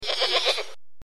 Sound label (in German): Ziege; Tierstimmen